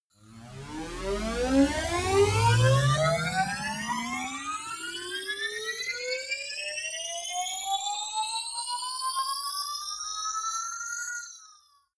hover glitch, Risers; whooshes rises

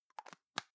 plastic can lid screw close soft; objekte; dose

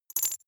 noise tiny splutter, signale; soundpool

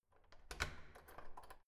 door key lock

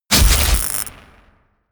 electricity

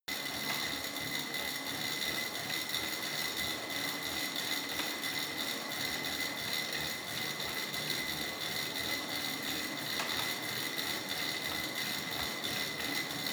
indoor bike sequence medium; sport freizeit

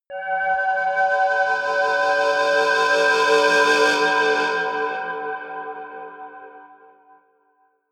soft hum drone high, drones

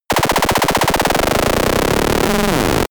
boss fight, laser; soundpool

